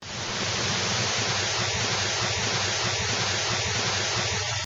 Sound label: TV Static on Repeat